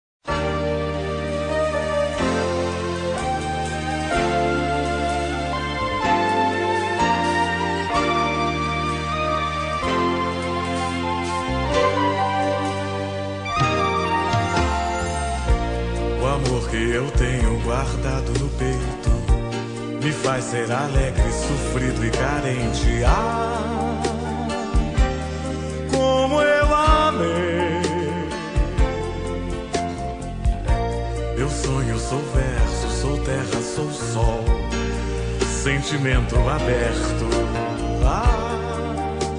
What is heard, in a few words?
samba